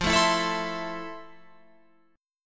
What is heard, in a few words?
F Major 7th, Guitar, Open F; strummed